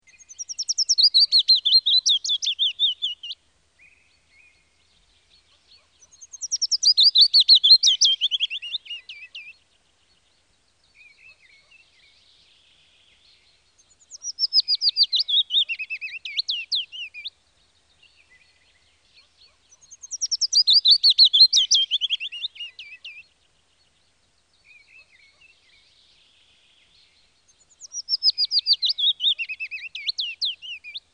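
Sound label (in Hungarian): Fitiszfüzike; Madarak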